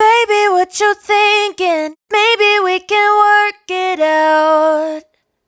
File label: hook 115 Ebm, Dry Vocals; Raw Vocals; Slate Digital Omnivox Vocal Sample Pack